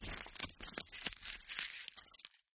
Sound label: paper crumple; paper mvmt